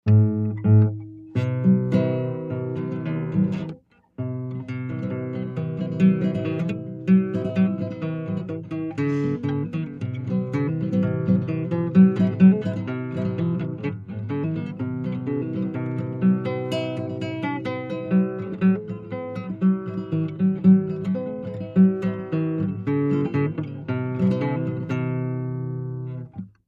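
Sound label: Acoustic Guitar Play; guitars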